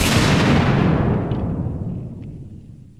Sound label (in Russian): звук взрыва